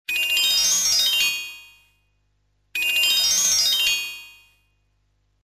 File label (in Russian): zvuk legkaya nastoichivost; zvuki sms